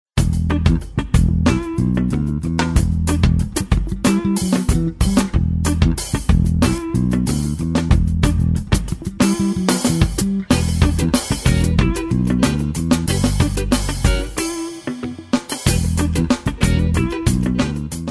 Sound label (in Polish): Perkusja; Sferia; MAYA